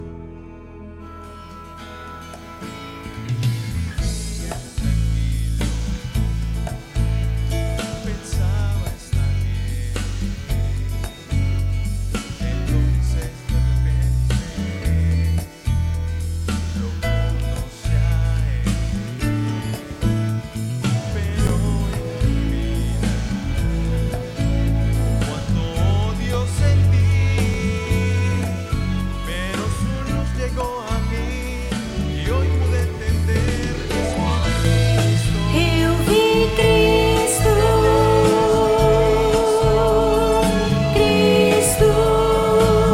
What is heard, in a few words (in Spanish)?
Sopranos